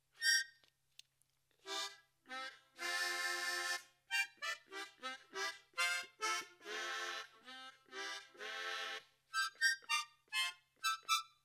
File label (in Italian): ballu zoppu armonica, armonica a bocca; trunfa; isre